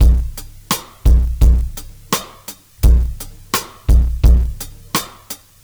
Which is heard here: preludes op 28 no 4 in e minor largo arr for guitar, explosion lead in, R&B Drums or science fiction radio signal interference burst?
R&B Drums